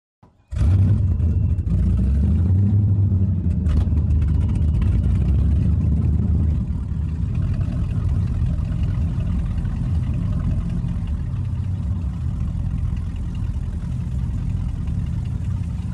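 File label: Power Boat Interior Start Away, off shore power boat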